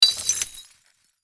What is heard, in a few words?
Tiny bottle break